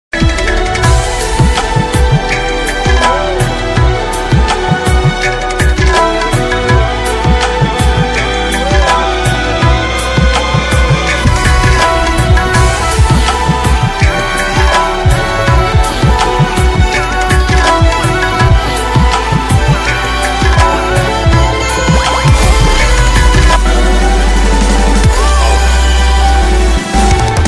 punjabi sad ringtone movie background music ringtone; sufna movie